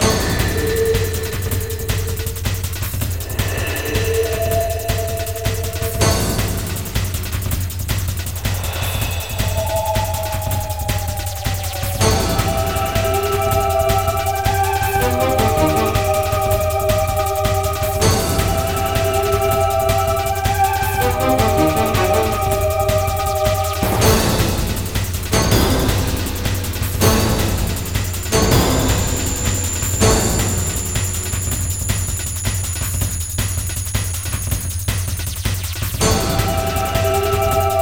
Battle; Northern Cave; Shining Force Neo PS2 gamerip 2005

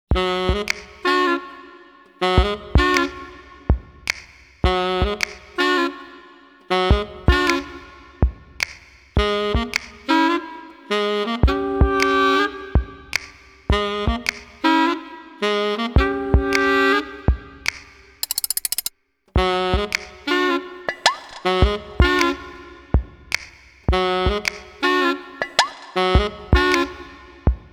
suspicous sax; ayashi no sakkusu

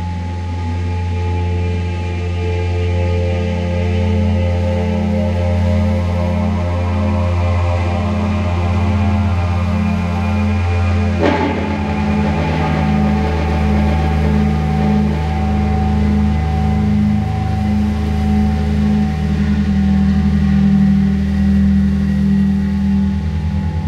Trinity Loop

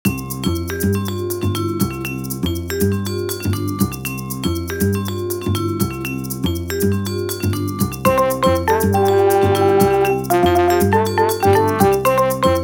Gadget Music; Didji Racing Tiki Tropics

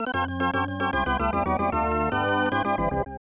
Fanfare ~ Classic; super bases loaded 3; snes

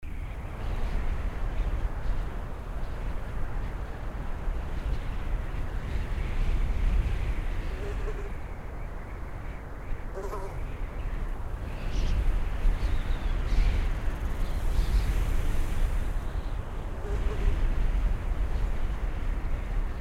Wind Music, Day General Back; children of morta; Sahra

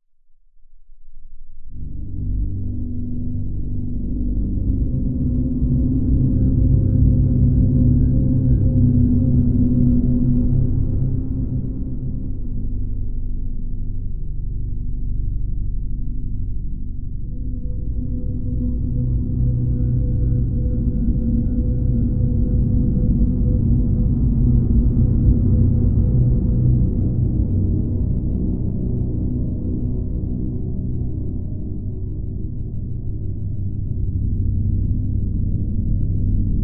lo down atmospheric cave ambience

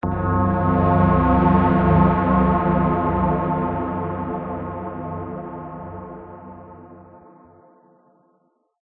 night ambient stinger

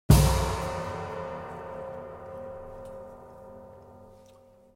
Kicks

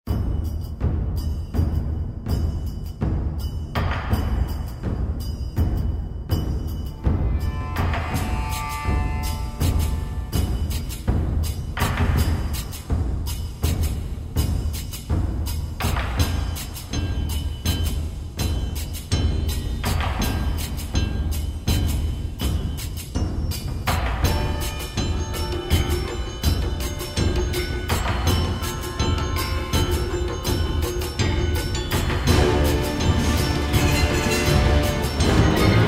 Taiko Brigade; Medal of Honor Allied Assault War Chest

